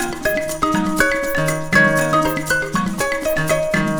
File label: africa; Google; NewWave Labs